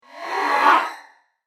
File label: Science Fiction Robotic Scan Electronic Whoosh; Robot Sound Effects; SFX Bible 6